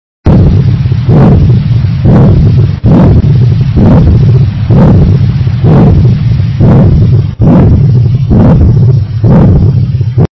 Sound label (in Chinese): 连续性杂音; XinFeiTingZhen Dog